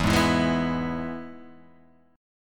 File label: E Major; 12,11,14,13,11,11,x,x; strummed